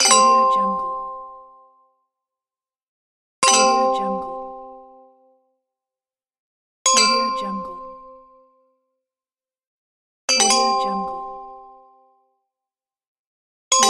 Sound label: notifications